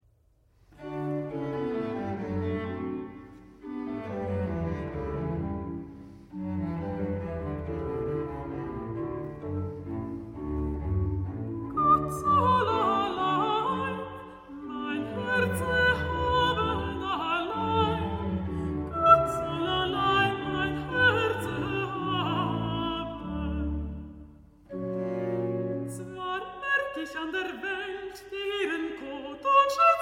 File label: arioso alto; gott soll allein mein herze haben; bwv 16